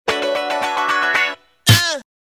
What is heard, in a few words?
riff